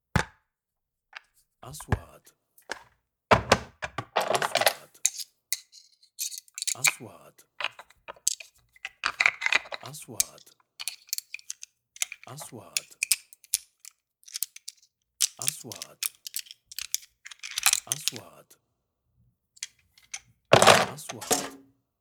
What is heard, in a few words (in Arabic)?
سكاريب; sound effect